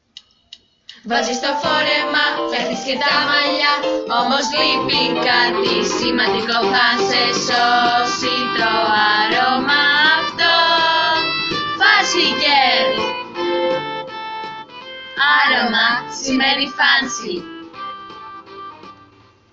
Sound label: JINGLE